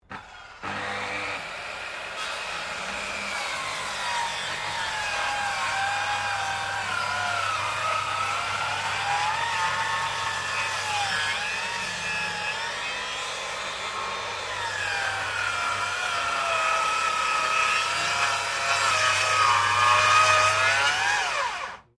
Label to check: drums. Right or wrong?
Wrong